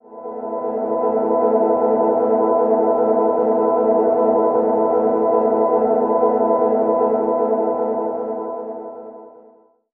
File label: Ice Temple A, Ambient Pads; Zero-G Seismic Frequencies; 90 s Sample CDs